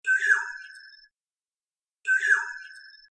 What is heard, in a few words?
oriole call